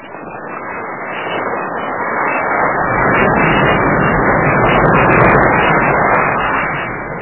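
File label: ocean noisy muffled soft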